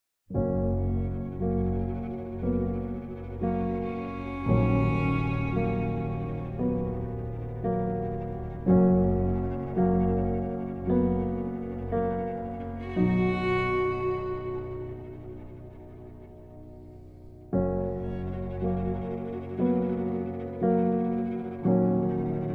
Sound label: Sad Song